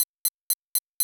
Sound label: bomb trigger; Ion Shift